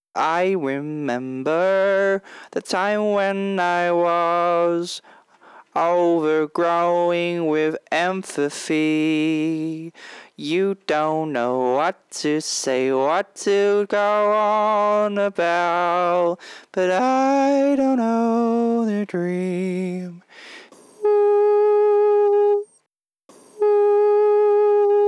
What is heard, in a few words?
140 bpm Voice Solo; remix fragments